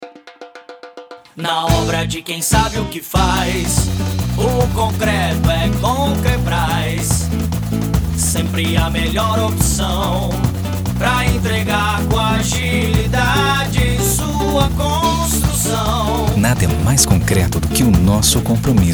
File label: Jingle